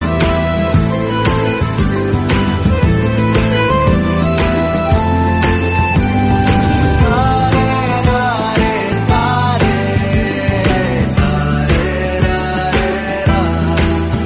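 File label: background music Telugu Ringtone; Color Photo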